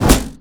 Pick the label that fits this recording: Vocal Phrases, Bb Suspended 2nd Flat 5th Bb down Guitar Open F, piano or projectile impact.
projectile impact